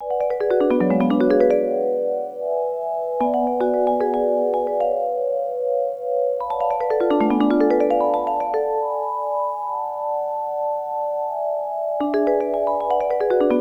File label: music forest bpm150; forest09 var5; Donkey Kong Country Returns HD